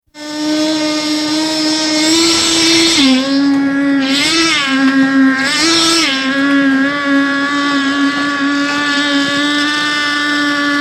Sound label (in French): vibreur, chantier; Culture sonore